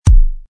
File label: button press